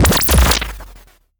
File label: Artificial Breather; Plastic Surgery